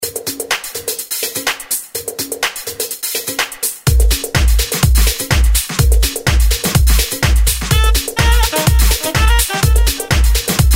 FUNK

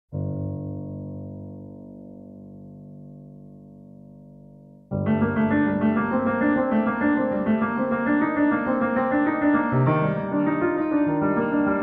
PIANO

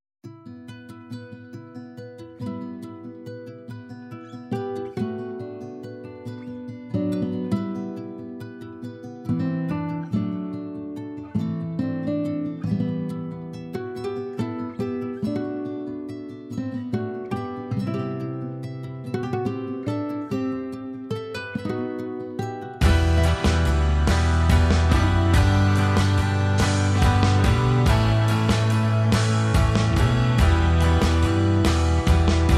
SERIE RETRO POP

